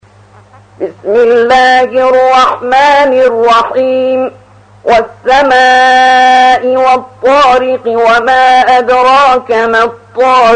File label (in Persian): ترتیل سوره طارق